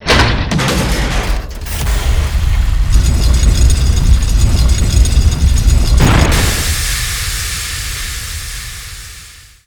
Basement Door; Lucius III

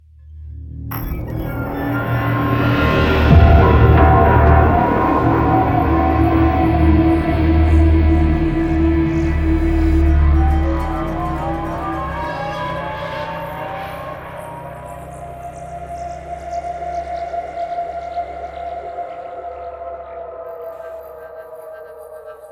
Cue 120bpm Sting

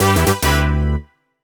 Jingle Score; clubhouse games 51 worldwide classics 2020 switch gamerip

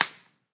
Slap; Talking Tom Cat 2; android ios

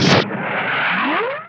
Alien Fly

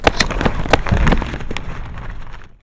nudge

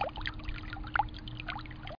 Ripples; Effects; Scratch

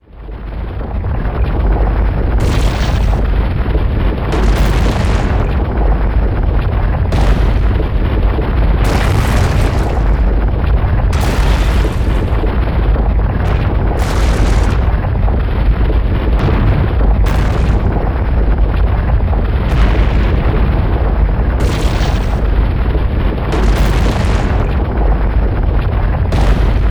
Fire; pokemon mystery dungeon rescue team dx

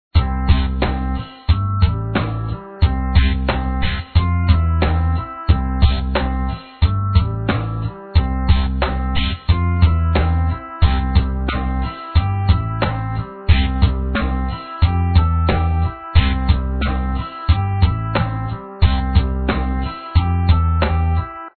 Score Card; annoying orange pinball online gamerip 2012

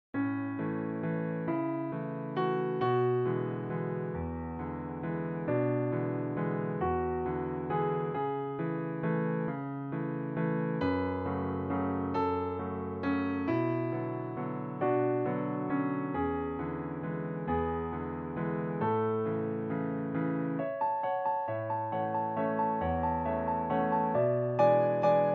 Waltz, piano; Piano app by Yokee; Skaters Waltz

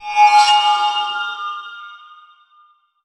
light in dark spell

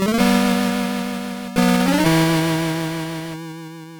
Death; prince of persia; master system

